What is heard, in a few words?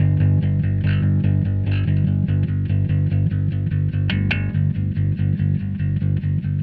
Bass Guitar Strum Loop 145bpm